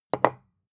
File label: bottle put down tipping